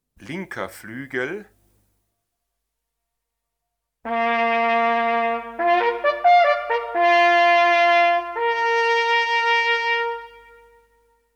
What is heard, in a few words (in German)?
Linker Flügel